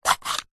foley container plastic display case small lid slide open; zapsplat